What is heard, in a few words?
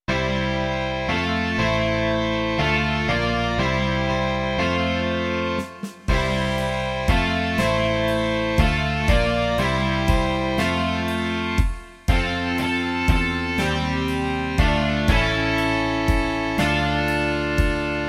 rock